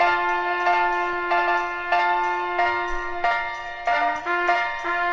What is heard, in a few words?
piano and horn, Loops; Phonky